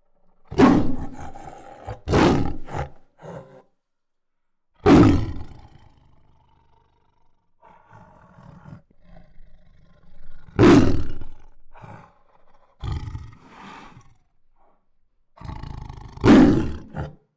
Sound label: Female Tiger Protective Roars; Tigers; Sound Ideas